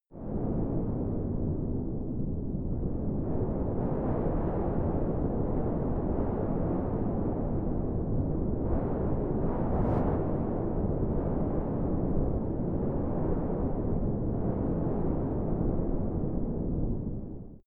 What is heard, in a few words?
wind soft interior